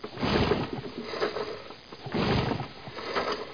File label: LAWN MOW; Image Bonanza; Expert Software